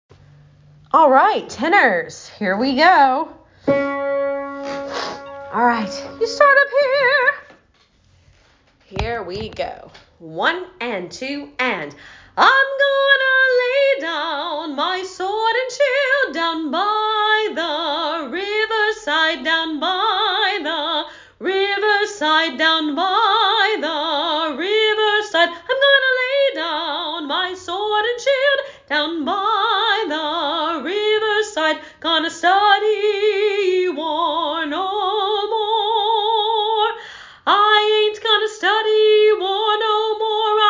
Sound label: Down By Tenor